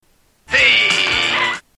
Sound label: aayyy